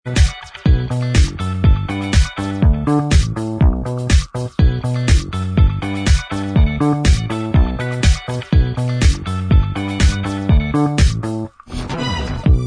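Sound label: Instrumental Track, backing tracks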